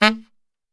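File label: A saxophone shot, Brass Super Section; Best Service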